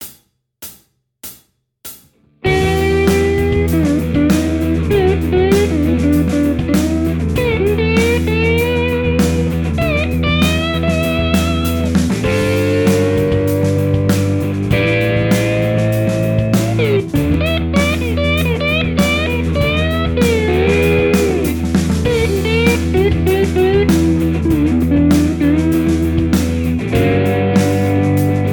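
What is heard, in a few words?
Slide jam track, Guitar; Guitar Techniques 2019 Issue 290 January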